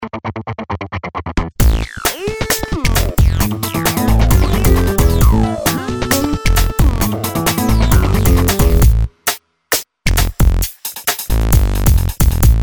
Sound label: Music background pads